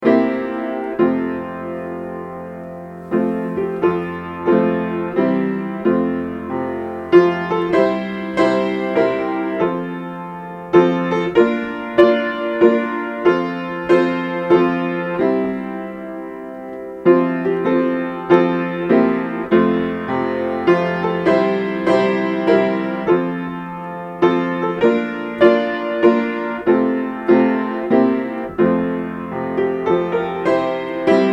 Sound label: Trust And Obey, piano; Trust and Obey, for there is no other way, to be happy in Jesus